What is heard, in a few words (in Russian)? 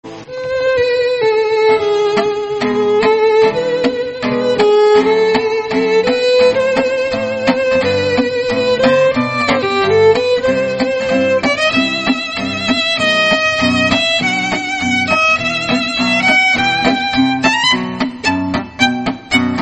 Vengerskij Chardash fragment